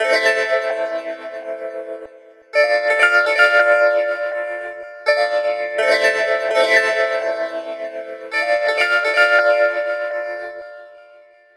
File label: Bpm83 Am Delicate Synth; Dollar Dream; Prime Loops Urban Dreamz